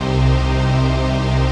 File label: CHRD PAD; Best Service; XX Large Pads; Sample CDs